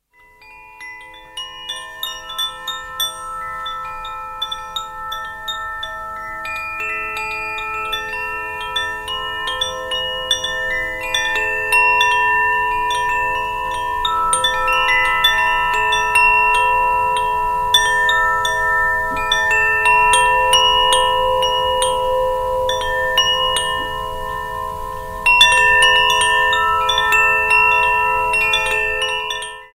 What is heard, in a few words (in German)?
Koshispiel Luft; Faehrtoene